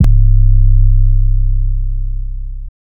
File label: drop, 808s